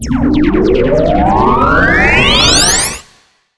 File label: increase speed; Earth and Beyond; EA Games